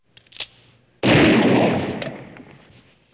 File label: shotgun blast and cocking